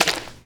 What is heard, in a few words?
fs fm paint, footsteps; player; Portal 2